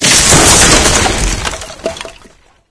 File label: tree explode; video games; source engine; ep2; dog v strider